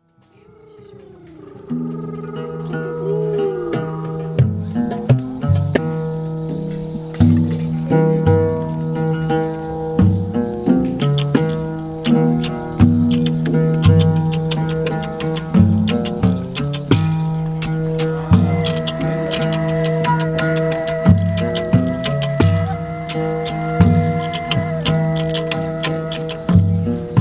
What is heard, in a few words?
Spiral Trance